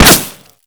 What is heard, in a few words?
Projectile Sounds; Red Orchestra 41-45